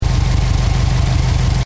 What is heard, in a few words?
Engine: Tick Over Revs Loop, car